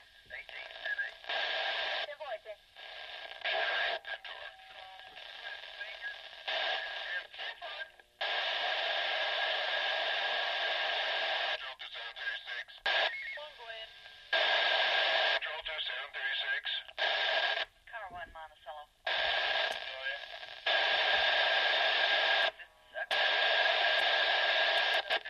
Futz Radio: Radio Hash And Static With Some Pointed Lines Distinguishable. Spanish At Tail